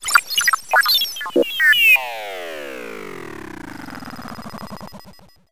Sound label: science fiction robot death